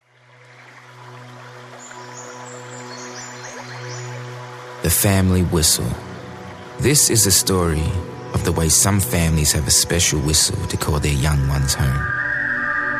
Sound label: cleverman whistle